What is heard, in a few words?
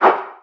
MegaShrink; MBHaxe; mbu